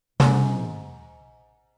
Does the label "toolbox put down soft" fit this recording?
No